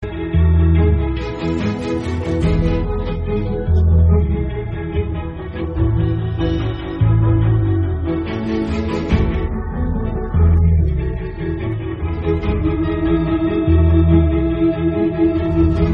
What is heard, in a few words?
Instrumental song